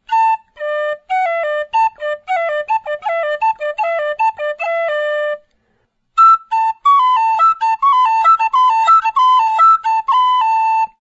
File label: triplets